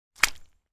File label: Single footstep wet muddy puddle